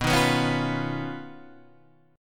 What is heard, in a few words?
D sharp 7th Suspended 2nd Sharp 5th